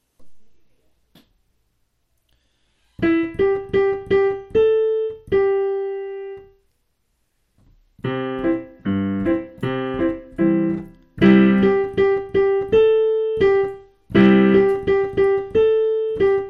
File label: slow piano